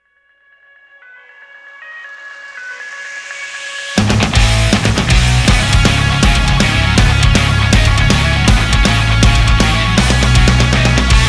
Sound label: ROCK